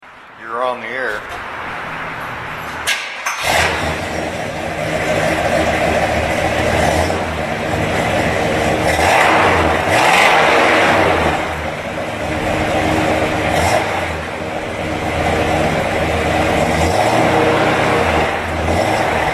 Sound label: Olds 442 455ci Duals Flos, custom exhaust sounds